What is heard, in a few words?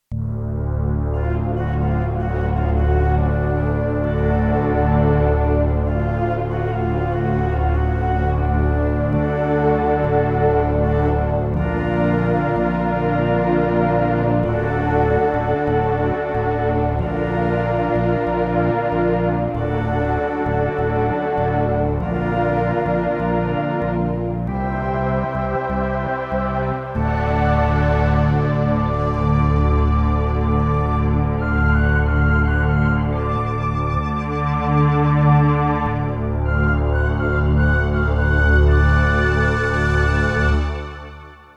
space prelude